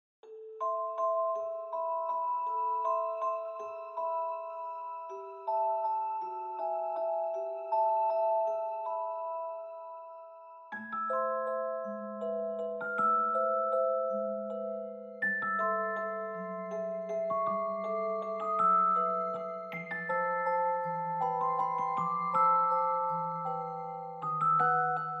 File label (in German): Glockenthema; Full Version